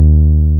BAS TB303 D#3

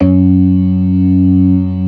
ORGAN SYNTH; InVision Interactive; Keith Emerson

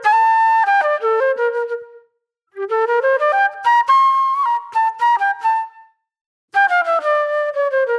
Reflective Flute

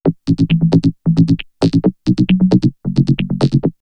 Vocoder Loop; backtothe70's; X-Static Goldmine; VocoderLoops

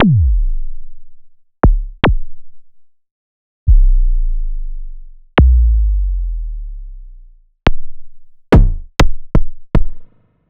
ARP 2600 Drums

